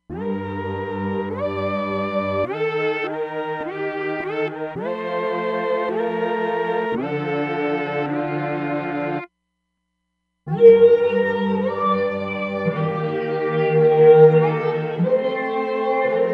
Flange + Big Room, Roland DEP-5; Factory Patches

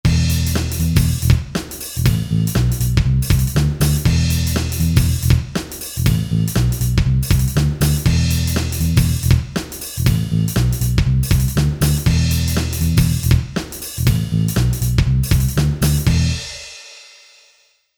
Pop

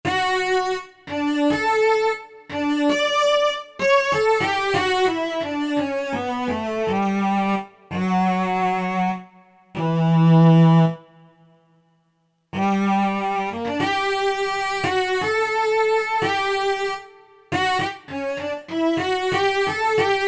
str, E-mu Proteus FX; BigCello

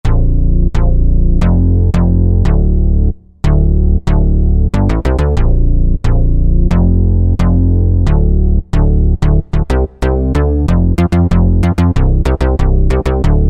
Kick up da Bass, Korg Wavestation A-D

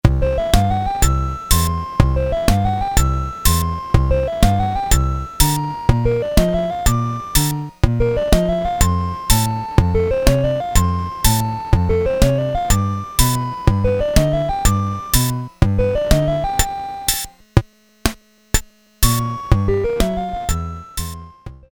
4 beat, Casio SK-1 rhythm

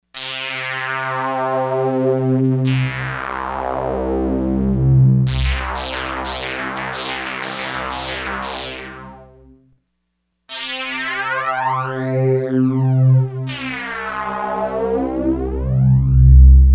MONO FLANGE

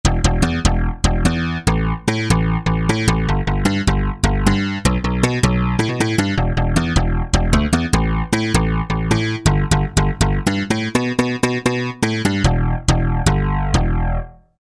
Thwap FM

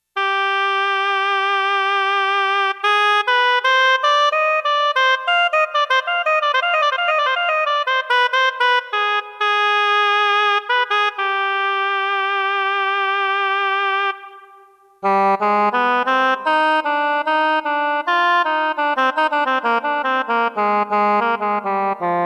REED, Roland JX-1; Factory presets

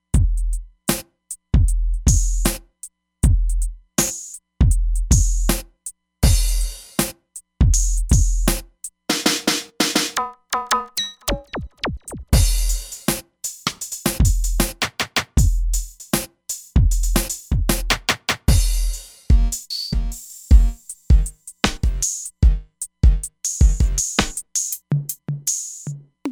Hybrid Kit, Roland Fantom XR; Drums

